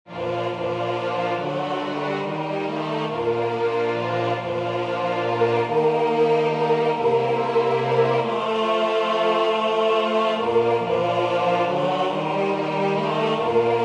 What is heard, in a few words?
TENOR